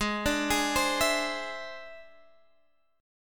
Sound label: E Augmented 7th; chord shape